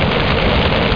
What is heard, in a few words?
flamthro; Xenosoft 2 (Game collection)(1994); QUARANT